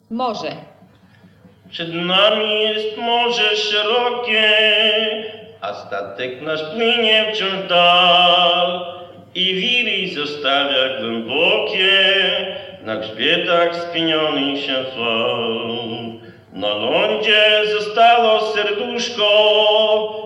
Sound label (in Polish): morze